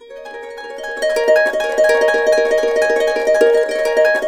BB, Harps; Complete Harps; USB Soundscan vol.62; AKAI

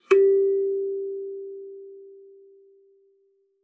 KALIMBA G4; Xsample VOL-7

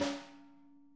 snare edge; Sonic Reality; Interactive Drum Kits

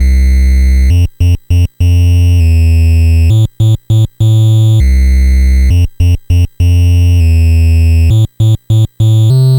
NES Bass, chiptune

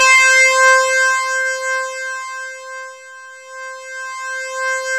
SINN LEAD, MORPHEUS; Syntec; Wall of Sounds

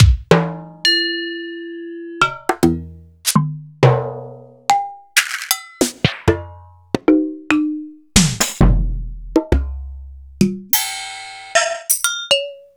EMU Planet Earth

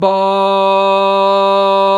VOX, Male Scats

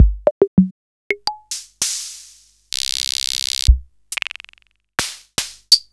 Korg MP7

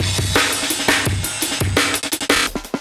Jungle Rocker, Breakbeats

